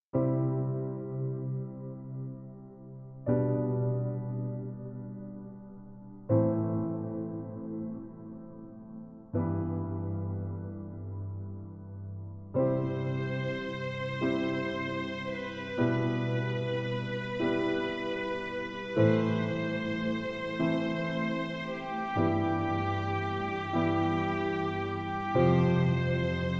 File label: Funeral Music Piano and Viola